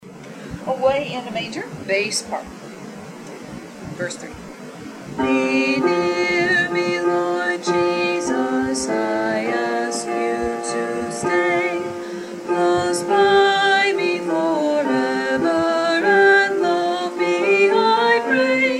Bass

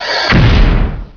Shield; hover

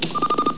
buzz in

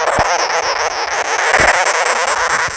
crab

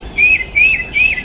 titmouse

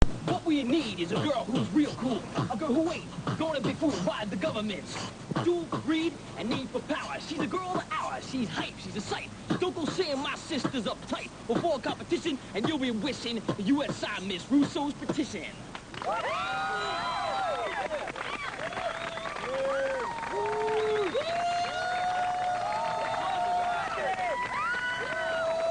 singing

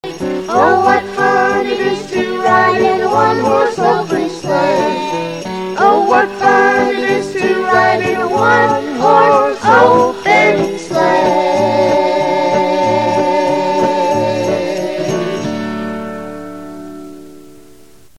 Jangle Bells

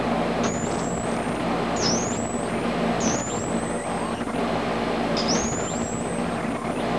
This is a recording of our 17kHz flyback supply.

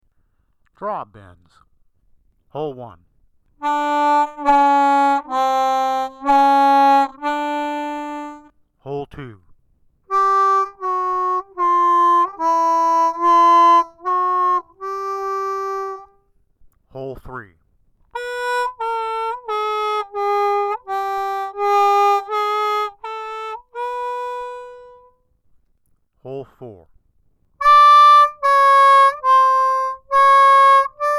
Draw Bends

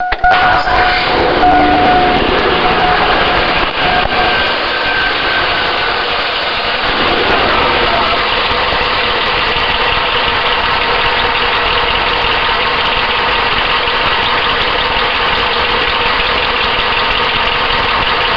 Piston Slap

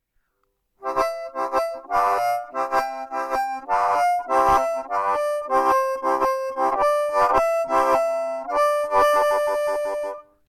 Vamping

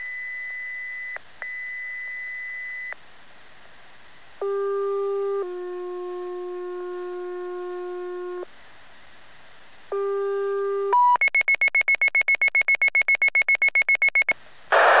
tone drop

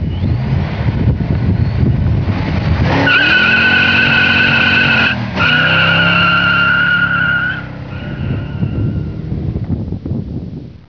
5.0 dyno muffs supercharge, mustang sounds

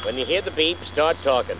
BEEP; When you hear the beep, start talkin'.; Mouse Hunt

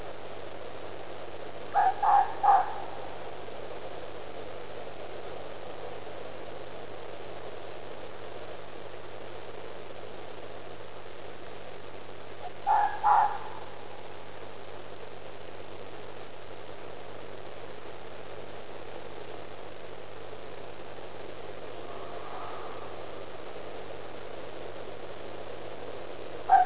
mating call, fox

